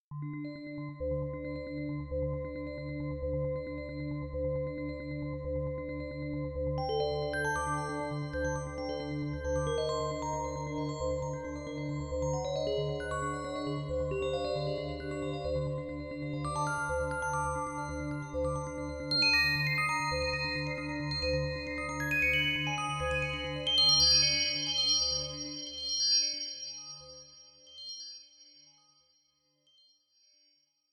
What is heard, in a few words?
Rising Bells